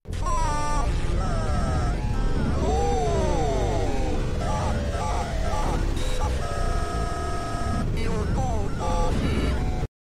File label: chase sound